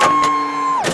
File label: bump; alienarena; war